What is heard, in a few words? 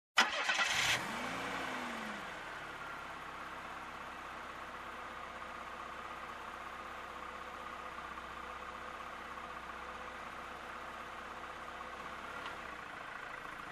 Engine Ignition driving around and stop